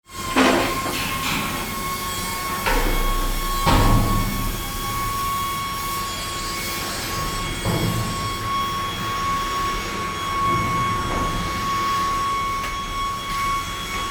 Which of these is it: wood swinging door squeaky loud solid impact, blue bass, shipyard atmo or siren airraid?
shipyard atmo